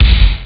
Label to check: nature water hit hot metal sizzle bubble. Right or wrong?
Wrong